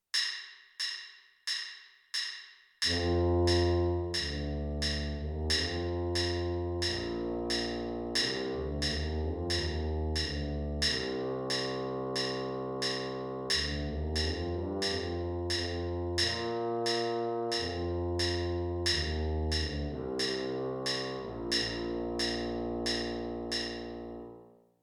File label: tuba part a